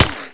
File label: ric, bullets